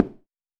High Heel

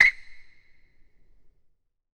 Basketball Court

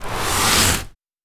Sand gravel scrape

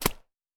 Mud

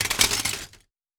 Glass scrape

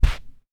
Carpet

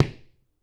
Sneaker

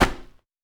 Snow

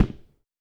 Dress shoe

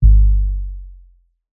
Sub Impact

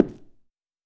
High Heel

